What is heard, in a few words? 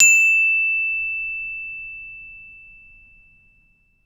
BEL ORCH